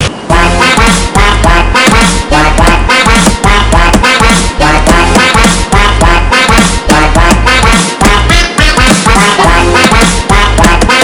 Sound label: Swing